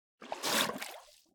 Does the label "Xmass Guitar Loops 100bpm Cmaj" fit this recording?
No